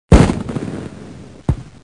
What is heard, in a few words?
gauss